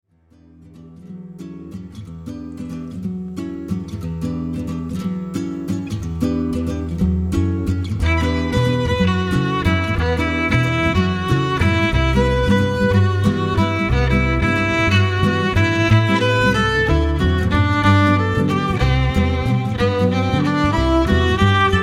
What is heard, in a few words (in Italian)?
trio Jazz